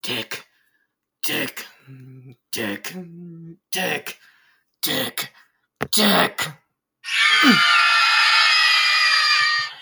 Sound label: scream bomb